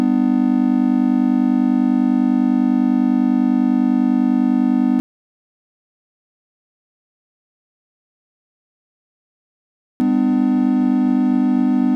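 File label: major chord Pythagorean equal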